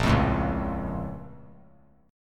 Bb Minor Major 7th; BbmM7; strummed; 10,12,12,11; JGuitar

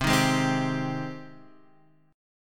C Minor Add Flat 6th C; maddb6:1,b3,(5),b6; Cmaddb6 Chord {X 3 1 1 1 3} strummed

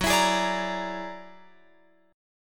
Gb Augmented 9th Gb down Guitar Open F; Gb+9 strummed

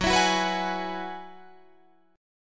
F sharp 9th Suspended 4th F sharp Guitar Drop D

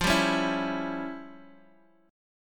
E Minor 6th F; Em6/F Chord {X X 3 0 2 0} strummed

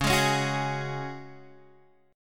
Db Suspended 4th Db Guitar Open G; strummed

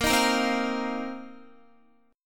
A sharp Suspended 2nd Suspended 4th down Guitar; A#sus2sus4 strummed

